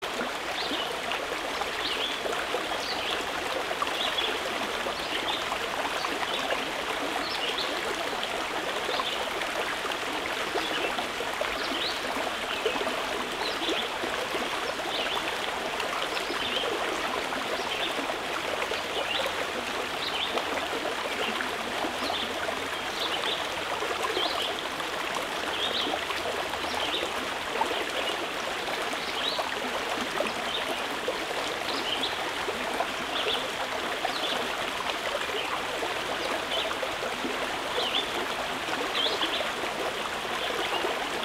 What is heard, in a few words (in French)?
bruit eau